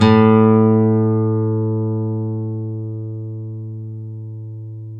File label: NYLON, GUITARS; Synclavier Sampler Library